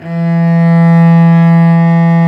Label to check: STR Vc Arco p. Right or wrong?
Right